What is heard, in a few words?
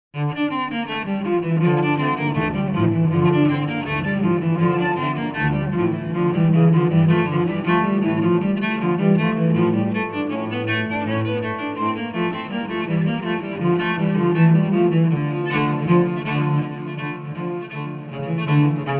Cello Duett